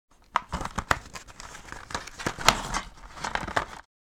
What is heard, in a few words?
Plastic materials movement